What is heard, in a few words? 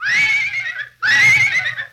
horse; Animals